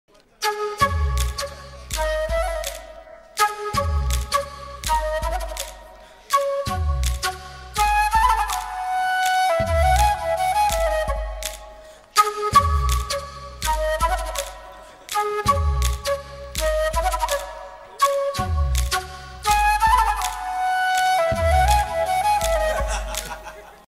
movie bgm love bgm ringtone love ringtone trending ringtone bgm ringtone; kushi